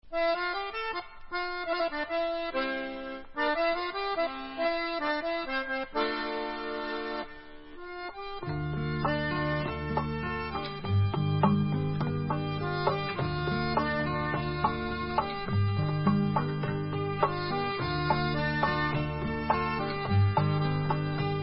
Instrumental

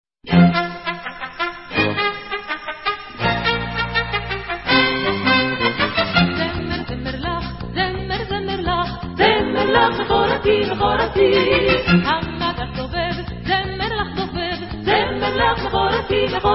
VOCAL

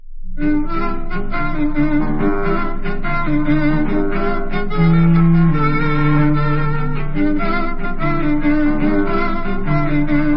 folk instrumental; circle from sarid

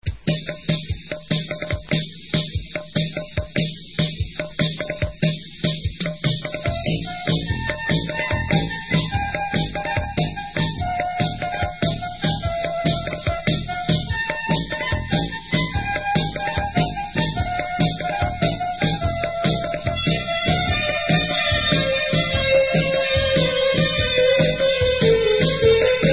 Debka Instrumental

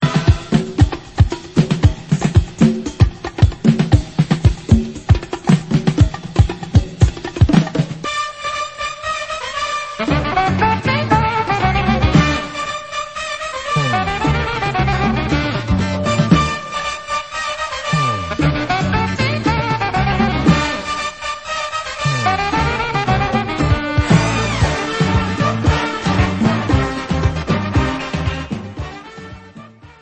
Debka Instrumental

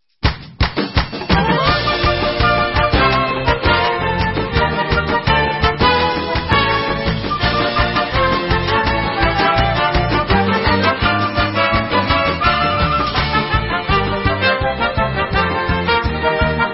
Instrumental

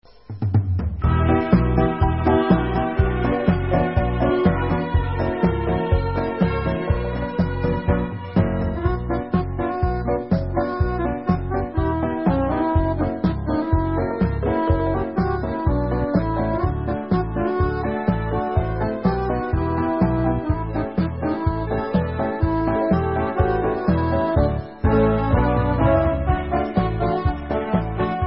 Instrumental

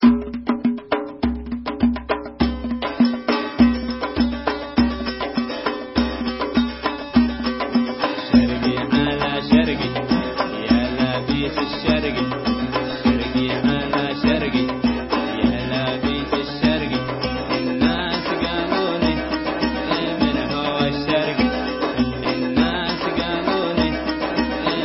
Debka Mizrachit

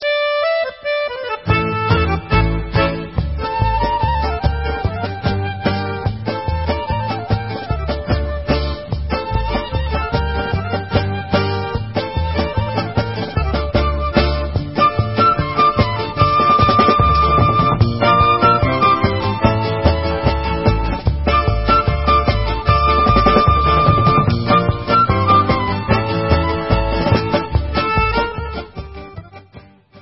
Instrumental

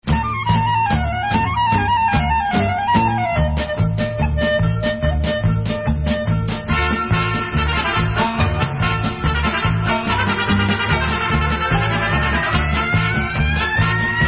Hora Instrument

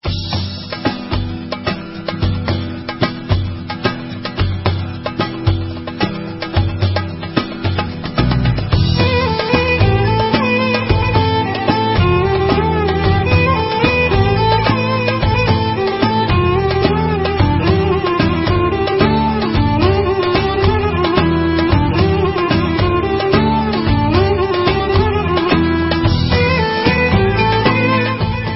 Instrumental

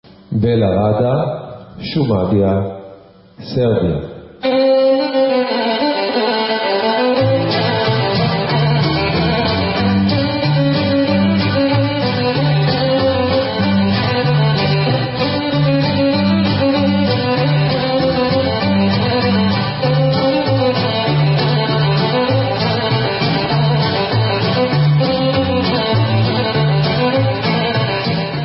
Serbian Instrumental

